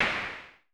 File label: SN Low Punch, Vermona Mono15 Drums Snare